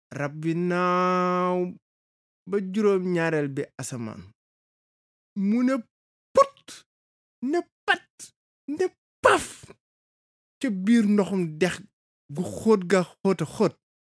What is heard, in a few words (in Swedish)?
bip